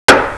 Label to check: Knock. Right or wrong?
Right